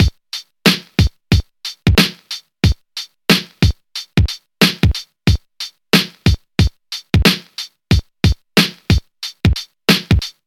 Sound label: 91 bpm drum loop g key, Free drum loop - kick tuned to the G note. Loudest frequency: 1691Hz